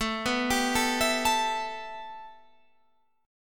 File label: A 7th Suspended 2nd A, Guitar Standard; A7sus2 arpeggiated